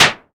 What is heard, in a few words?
present steel snare drum sound a sharp key